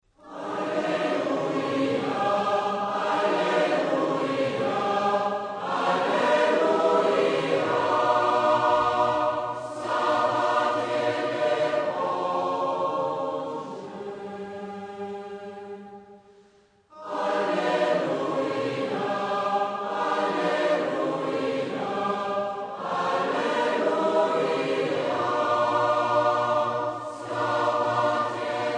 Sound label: Taize alleluia